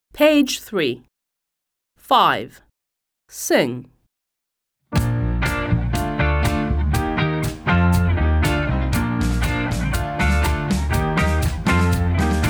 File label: song A to C; American; My alphabet book; Smart Book 1